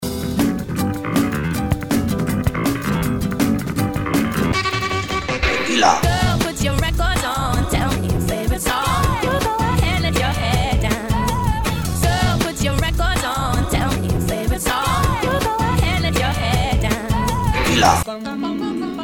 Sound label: Beats Eye Made